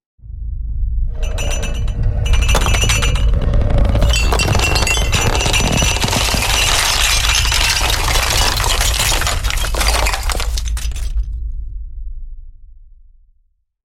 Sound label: Realistic sound of Earthquake rumble starting with shaking crockery; Realistic sound of Earthquake rumble starting with shaking crockery and ending with with building collapsing; Sound Design; Dramatic Earthquake; Very scary !